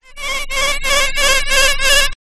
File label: science fiction robotic fly bee fly close; zapsplat